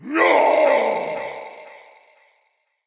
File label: shout; smite; odin